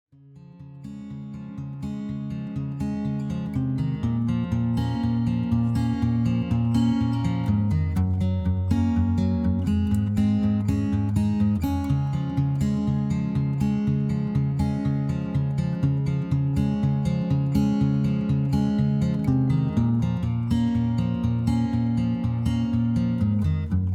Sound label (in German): db-dur